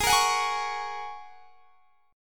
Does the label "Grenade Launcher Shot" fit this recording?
No